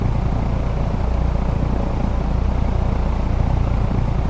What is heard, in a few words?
Fan